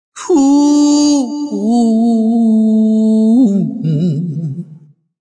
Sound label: Singing M, Male, Melisma, singing, w. FX, vocalization, song, (human voice)